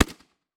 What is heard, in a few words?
Weapon Sub Machine Gun World War II Historical Single Shot; AdobeCC